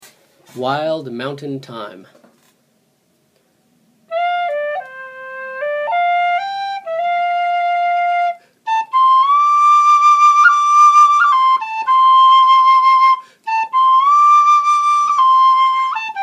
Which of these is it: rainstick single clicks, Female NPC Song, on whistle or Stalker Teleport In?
on whistle